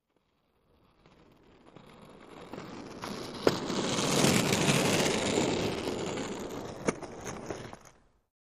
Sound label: Roller Blading By